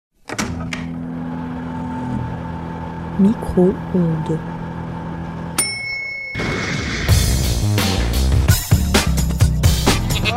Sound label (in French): generique emission micro ondes